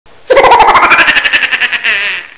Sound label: duck laugh